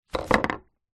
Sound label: foley glass bottle in paper bag knock over wood; zapsplat